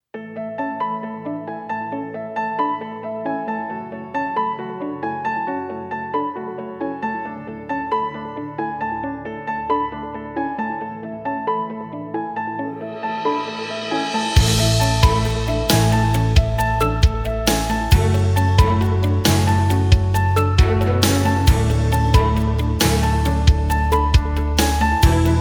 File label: Acoustic